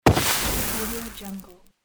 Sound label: flare gun